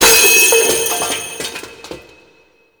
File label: mask knock off; Deacon; Warframe; The New War